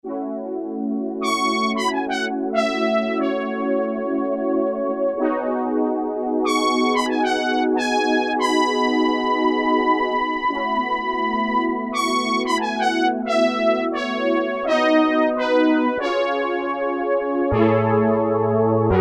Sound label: Euphonium Choir, Roland MKS-70